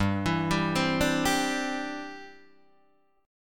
C-Major Add 9th G, Guitar Half Step Down

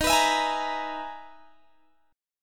E-Major Sharp 11th D sharp

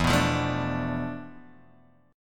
D Minor 6th E, guitar chord; Dm6/E Chord {0 2 3 2 3 X} strummed

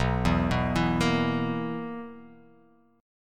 B Major 7th Suspended 4th B down

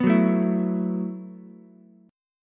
E Suspended 2nd Suspended 4th E, Ukulele; strummed; JGuitar

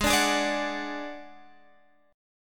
D-Major Flat 5th, Ab

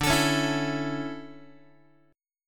D Major 7th Suspended 2nd, Guitar; strummed; JGuitar

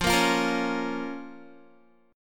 F Suspended 4th Sharp 5th F, Guitar; Fsus4#5; strummed

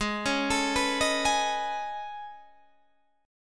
A Major Sharp 11th, Ab

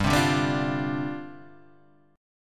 C Suspended 2nd Suspended 4th, G

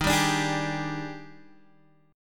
Eb Major 11th, Guitar, DADGAD

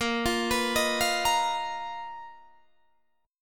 B Major 7th Flat 5th Bb, chord shape

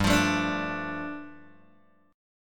G 7th Suspended 2nd G down Guitar Open G

